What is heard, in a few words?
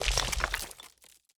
environment block upgrade stone